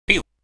tank shoot; dod; mapsounds

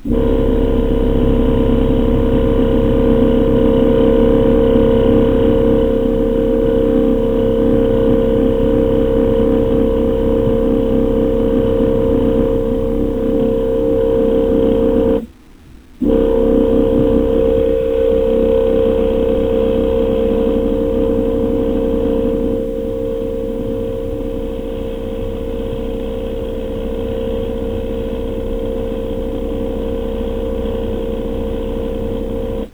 drilling; ambient; enviroment; ProjectMobius

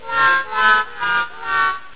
wawawa

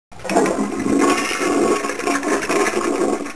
flush

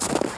player snow; firearms

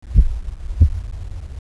heartbeat; svencoop; saker

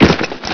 dirt, player; firearms

